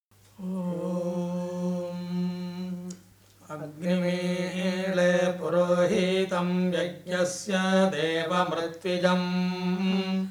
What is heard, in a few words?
Rig veda Opening Hymn; image acoustique